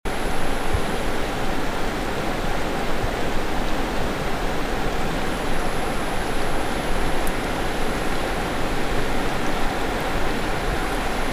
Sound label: Ambient Creek